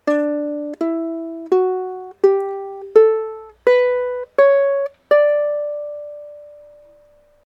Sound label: d maj, ukulele